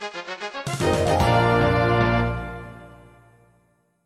fanfare

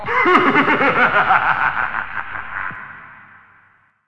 Devil

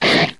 Slash

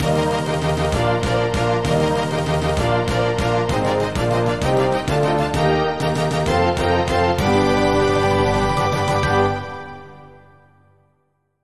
fanfare

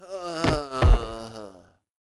Cry

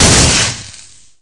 shock

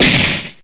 Damage

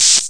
Paralyze; ycrpg

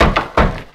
Move; ycrpg

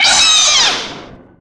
Monster

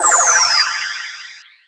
Ice

